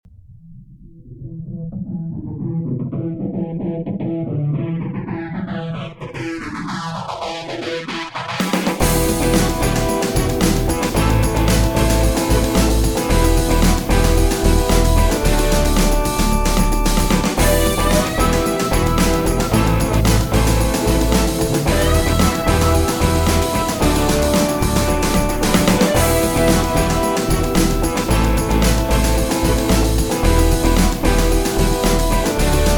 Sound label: heavy